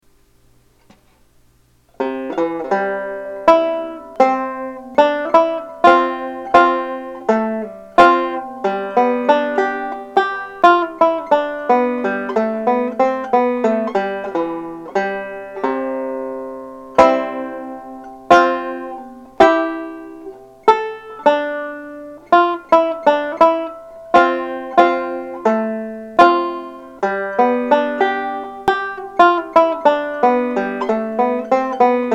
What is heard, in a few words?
schottisch, banjo